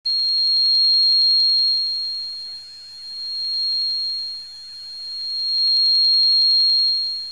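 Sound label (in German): Hochfrequenz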